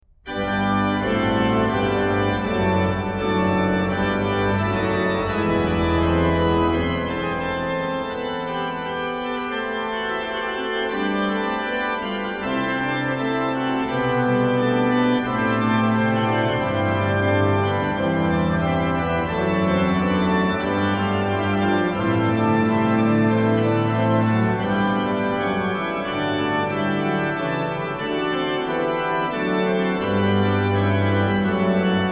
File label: organ; BWV1099 Aus tiefer Not schrei ich zu dir; BACH; BACH ORGAN WORKS; Chorale Preludes